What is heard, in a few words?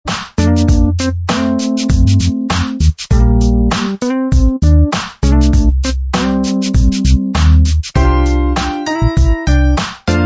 Jazzy Smoothy